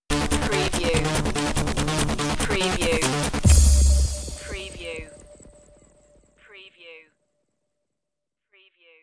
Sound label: time machine stinger